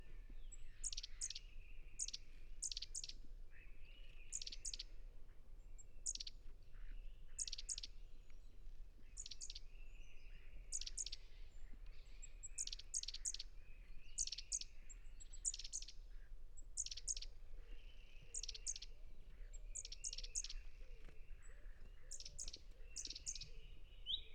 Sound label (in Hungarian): szencinege hangja